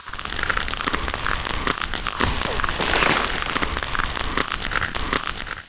dig out; ambience

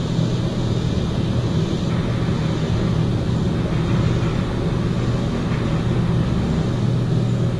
elevator move

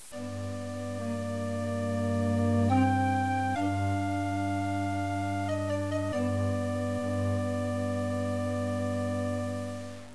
clown gate theme

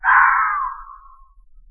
fox cry, forest trail ambient

